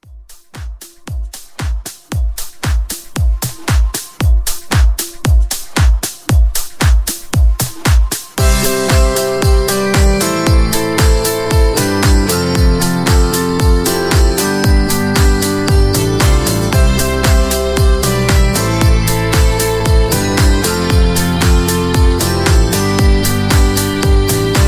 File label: Re Drum, 115; Merry Christmas 2025 Holiday